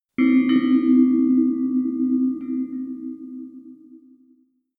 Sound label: melodic devil's clock; uhren-glocken-klingeln